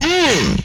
Hit, Vocal; retro arcade

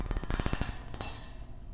Rhythmic Metal; BB Retail Therapy Sample Pack

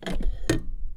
Ceramic Bowl; BB Retail Therapy Sample Pack

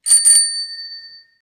bell

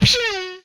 Gun, retro arcade; Guns

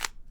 Big Cube - Click, Rubik's Cube

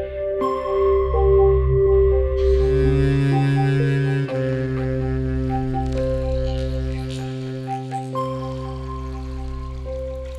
Fadeout, Pads; BB 2HTC Samples Vol 4

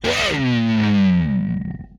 Falling, Vocal; retro arcade

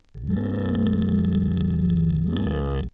Growl, beast or animal

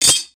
tools, wood metal; wood metal SFX

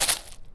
Shaking Box of Screws

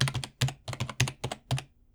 Keyboard - Typing Slower; Keyboard Sounds

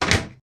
wood slam; wood metal SFX

